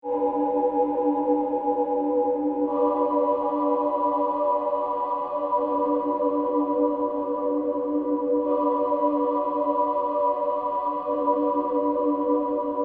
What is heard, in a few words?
Ship Ambience; iMovie '08 Sound Effects